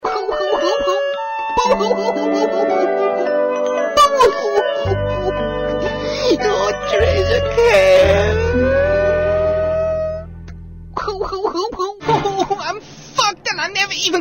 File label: cry